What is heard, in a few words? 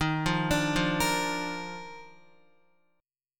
Eb Major 7th Suspended 2nd, Guitar; arpeggiated; chord; JGuitar